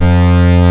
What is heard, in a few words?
KORG F3, Rare Synthesizer; Hollywood Edge - Giorgio Moroder Rare Synthesizer Collection